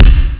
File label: Bass Drum